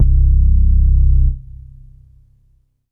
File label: DM BAS, Dance Mega Synth